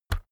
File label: keyboard external key push